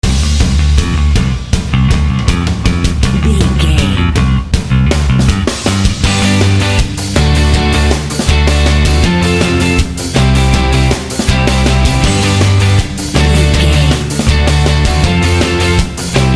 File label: rock indie rock inst mix